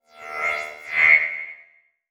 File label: Multimedia Internet CD-Rom Flash Electronic